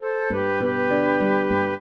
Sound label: trio, flute harp; Mozart